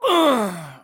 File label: pain severe; Borderlands 2; Jack